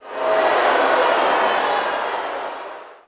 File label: Boo, Crowd, reactions